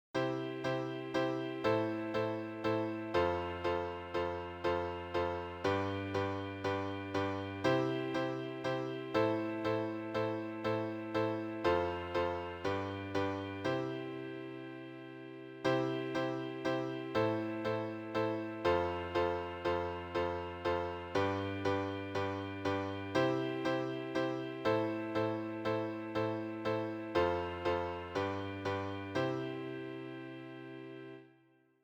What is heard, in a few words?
irregular metres